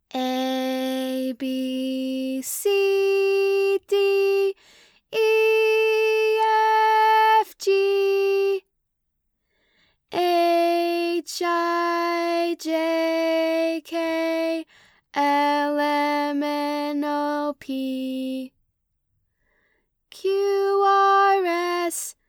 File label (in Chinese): 字母歌 甜美亲切